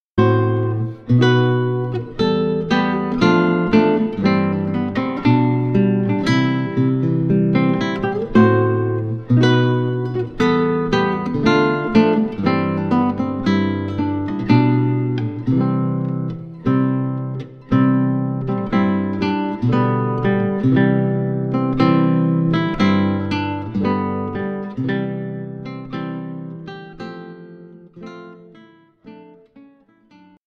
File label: Wedding March